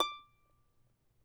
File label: charango D5; Spectrasonics; Hans Zimmer Guitars VOL-2